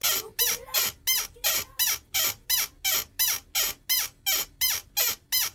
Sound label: creaking chair